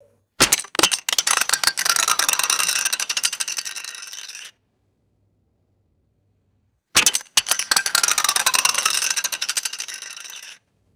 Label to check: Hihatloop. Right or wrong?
Wrong